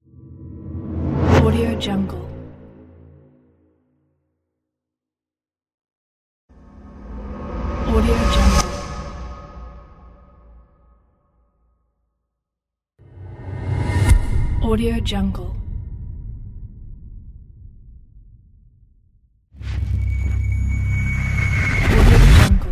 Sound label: reverse rise tension